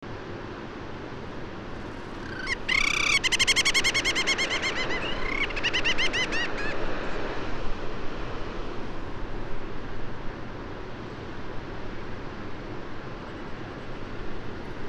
Alkekung

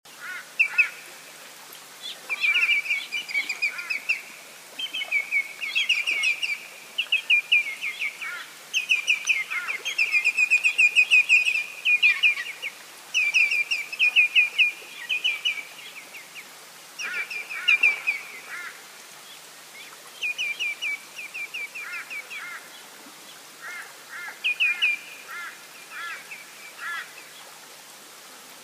Glutt

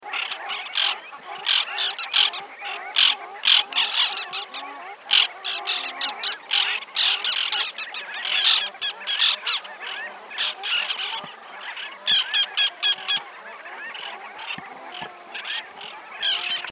Vitvingad tärna

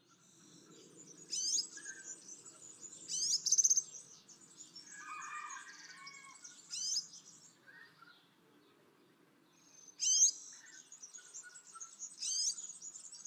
Gulhämpling

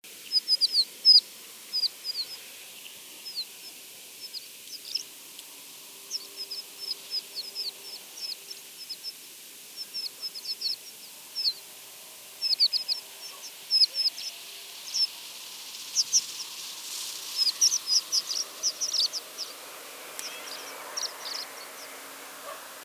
Berglärka

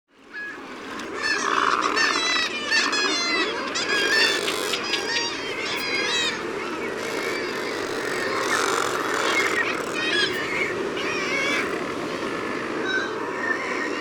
Tordmule

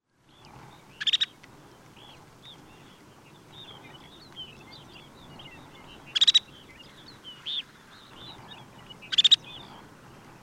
Snösparv

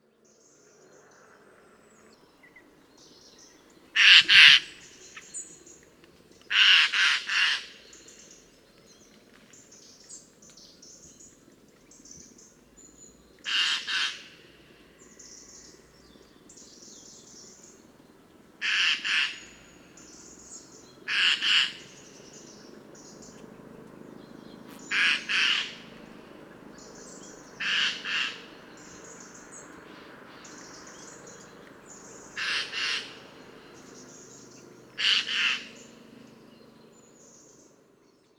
Nötskrika

